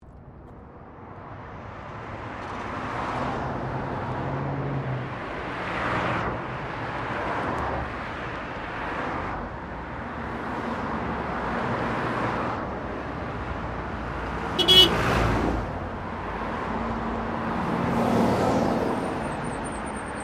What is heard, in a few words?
Traffic Cars General Pass By Horn; General traffic sounds; Traffic And Car Sound Effects